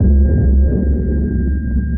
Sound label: sonar Ping Suit Medium Shuttle; Barotrauma; Real Sonar